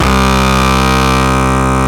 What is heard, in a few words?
WAILING, DIRE LIKE; East West - Global Sounds